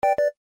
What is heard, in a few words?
Call Disconnected; LG KV500 Cookie